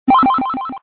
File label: pause sound; Chibi Knight